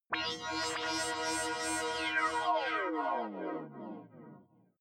sci-fi rising small planet

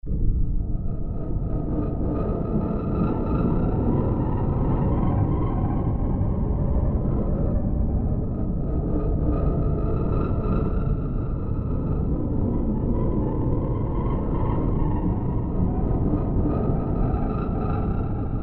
em transmission unit

